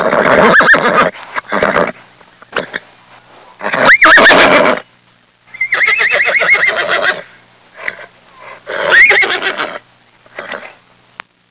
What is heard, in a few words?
horse neighing, farm animals; Sound Effects for Multimedia - Volume 1